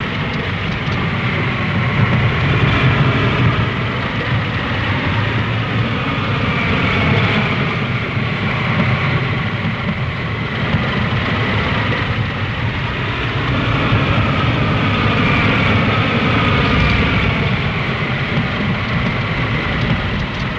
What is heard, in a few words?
B29 Spiral Dive Med, b-29 superfortress; B-29 In Spiral Dive, Medium Speed